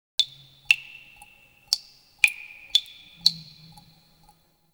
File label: Terrarium Drips; Warframe; Ambience; Entrati